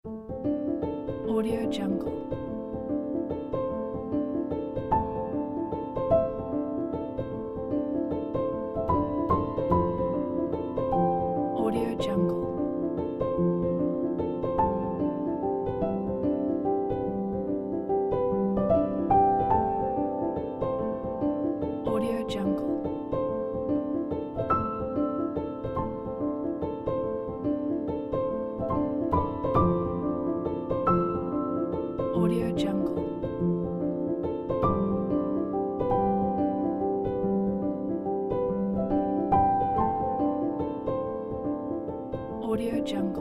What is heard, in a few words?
rustic felt piano melody